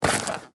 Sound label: mask on; goulloyne